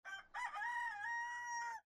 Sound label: rooster cock a doodle do distant